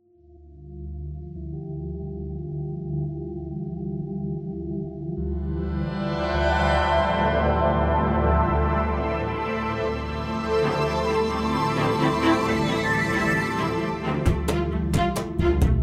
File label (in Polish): Muzyka dynamiczna